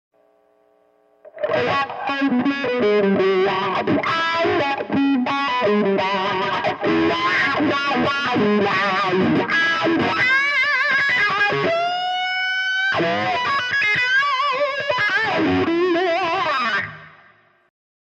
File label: - wah